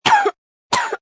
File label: Cough Short, Cough, 1 child, short x 2, human voice, kid